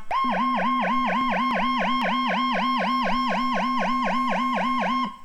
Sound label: WOOP & Howler